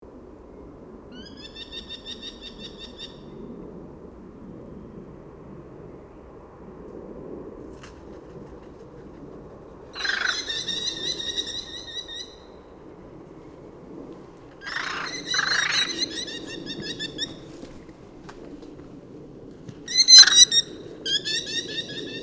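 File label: Eurasian hobby voice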